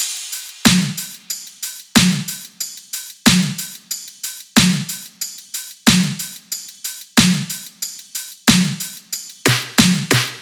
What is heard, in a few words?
top 92, Drum Loops; SD MIDNIGHT Sample Pack; Slate